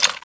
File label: clip in; tfa cso; t50